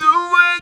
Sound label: "do it", Talk Box